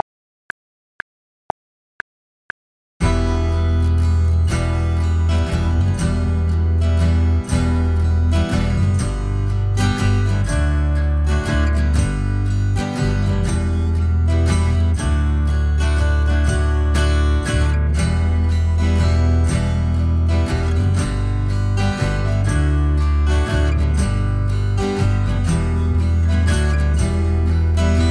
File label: 120BPM Backing Track